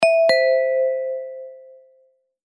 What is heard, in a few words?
ding dong